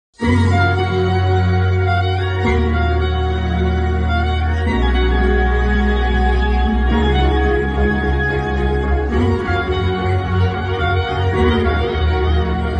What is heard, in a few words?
heart touching love bgm; amaran